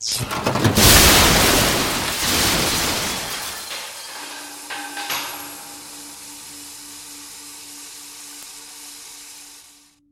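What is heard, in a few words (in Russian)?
скрипучий оглушительный громоподобный грохот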